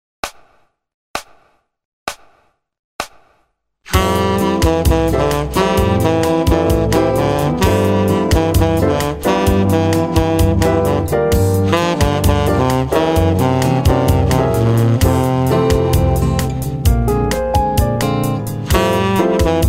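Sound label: Latino melody saxophone; MyVirtualBand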